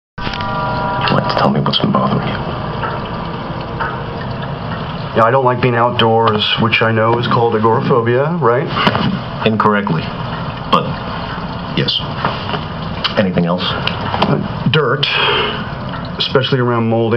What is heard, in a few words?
ticks